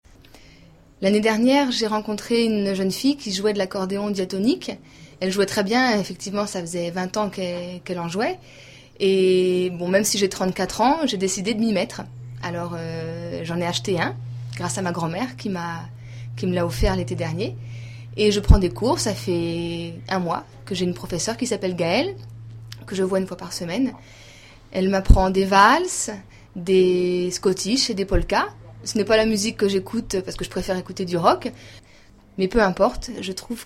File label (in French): accordeon